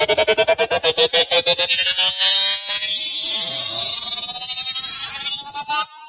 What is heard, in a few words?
transforming robot mosquito out